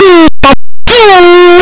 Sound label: pikachu cry; pokeyellow